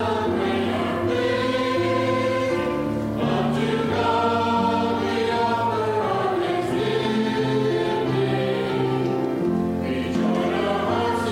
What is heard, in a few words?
Adult Choir; Reformation Sunday